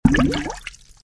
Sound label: bubbles; camp rock; rock n row